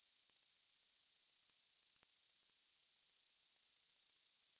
Aircrafts Engines Rotor KA50 Hi; DCS

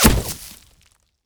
ground hit, damage; DCS

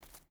footstep barefoot concrete walk; Rust

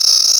tunneler worm prejump

